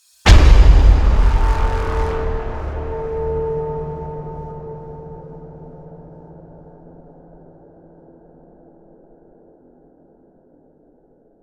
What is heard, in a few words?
Epic cinematic impact